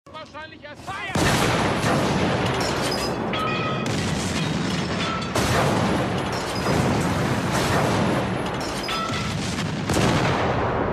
artillery

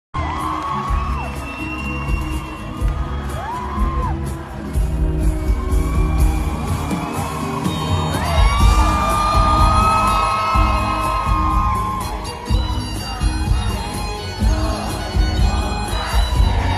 violin

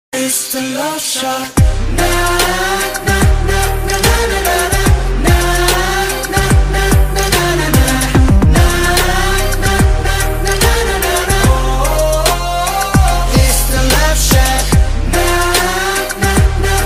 Korean ringtone

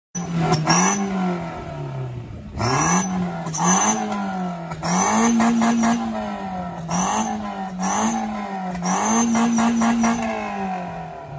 Exhaust whistle

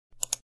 Mouse Click

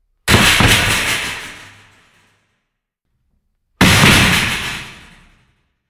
Metal shelf

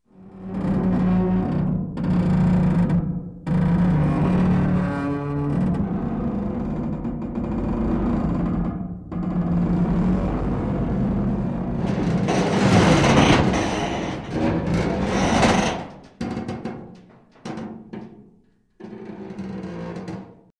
rusty metal

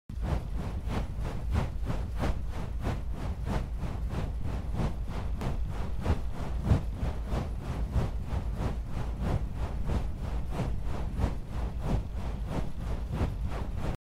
wind turbine

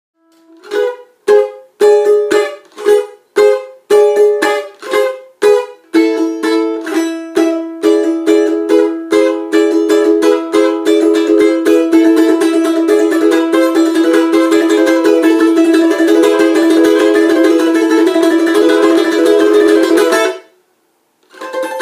balalaika

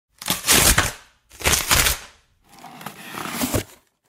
Cardboard box

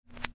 Zing

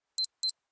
casio watch

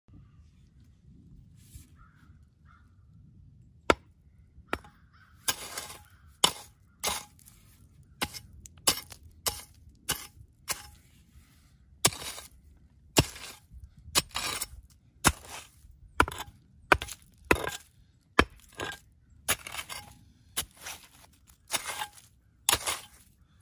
mattock

